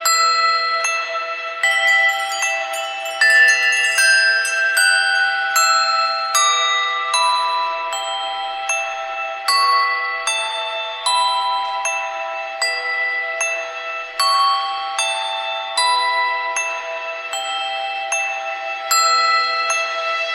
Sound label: Freedom Bells